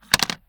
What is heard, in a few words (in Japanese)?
謎の物音